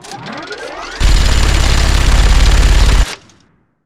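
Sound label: weapon minigun spin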